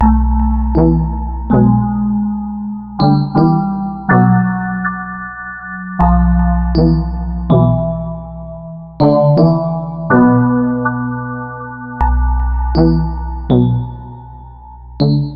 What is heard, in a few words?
stem intro; Pix the Cat